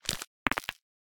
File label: neck crack; Smite; Cabrakan